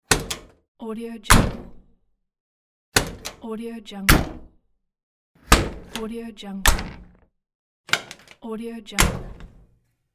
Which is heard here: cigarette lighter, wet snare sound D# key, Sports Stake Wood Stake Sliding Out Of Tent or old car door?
old car door